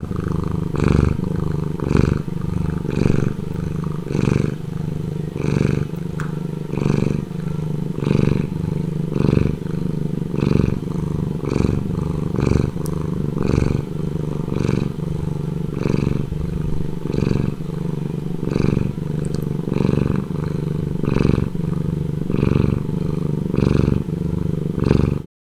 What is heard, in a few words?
Kitty Purr Stereo; libnyquist